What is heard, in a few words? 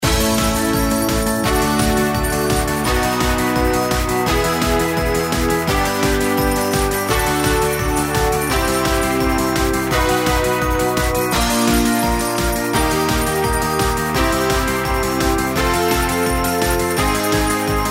mega win; Daji